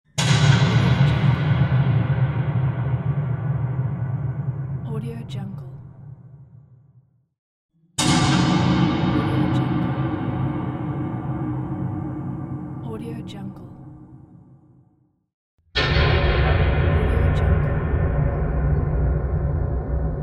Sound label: creepy impact